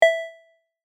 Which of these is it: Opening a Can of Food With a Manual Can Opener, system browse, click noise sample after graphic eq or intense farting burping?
system browse